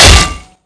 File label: head damage; Valorant